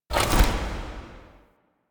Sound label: UI Mod Deactivate Countermeasures; Warframe; Railjack Player Ship